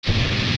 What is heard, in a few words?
Flare Dancer Drag